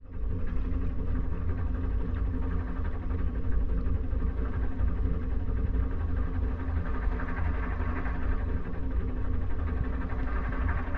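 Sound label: narrowboat onboard idle steady water right hydrophone, Gardner 4LW; 1960; Pole Position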